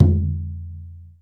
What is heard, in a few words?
CHINA TOM; AKAI S6000 CD-ROM - Volume 5